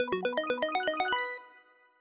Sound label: jackpot; Casualino; VideoPoker